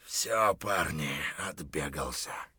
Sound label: hurt near death; Escape from Tarkov